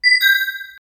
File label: correct answer in quiz